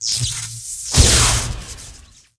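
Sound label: lightning bead attack, monster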